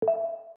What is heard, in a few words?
din